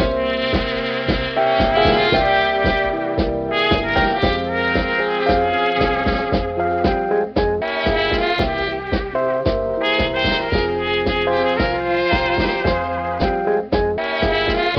horns and keys salsa, phonky loops

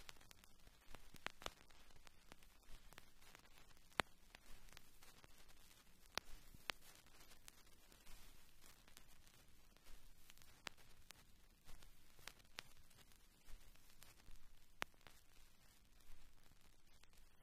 crackles, Vinyl

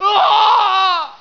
scream male

